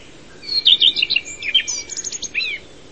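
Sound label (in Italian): Codirosso Phoenicurus phoenicurus; Cordirosso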